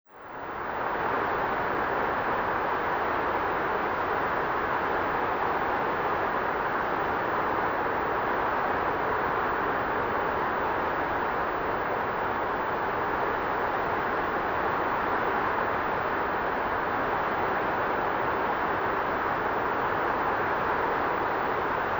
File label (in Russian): zvuk vetra purgi